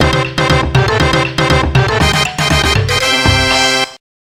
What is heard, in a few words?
Event Clear